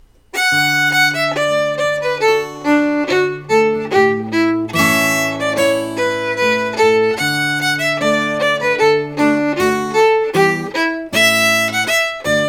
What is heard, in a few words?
Boston Polka slow